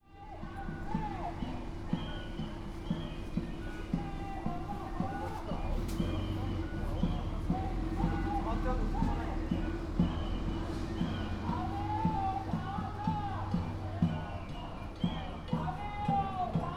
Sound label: demo fukushima; ekimae